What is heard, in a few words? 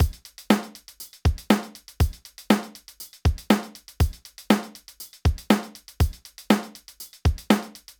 loop 120, Acoustic